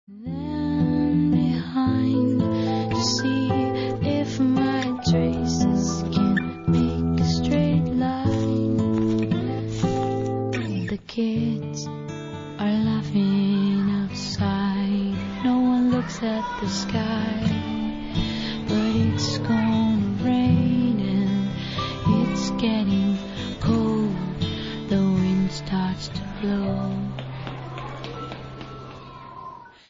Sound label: interlude